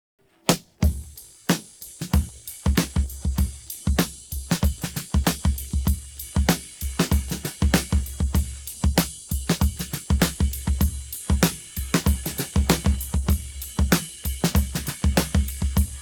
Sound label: drums pattern